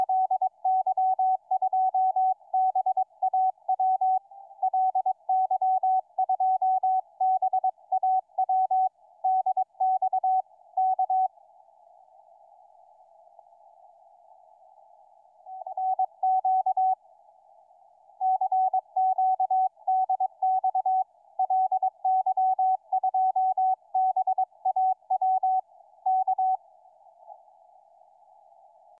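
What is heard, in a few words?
160m, signals on 160m band; ly2baw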